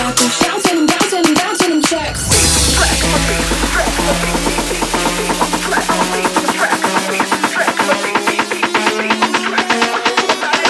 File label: acid drum boom